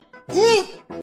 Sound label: uuhh